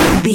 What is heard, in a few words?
retro impact hit